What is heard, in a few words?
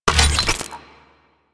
Oil Grenade Ready; Warframe; Vehicles; NechroMech; Abilities